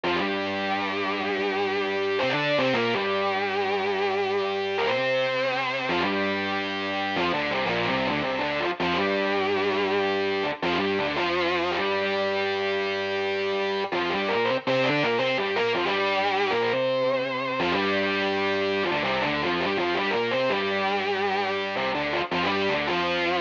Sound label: guitar A, Smooth RnB; Big Fish Audio; Legendary; Shes Mine